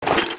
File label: pkm draw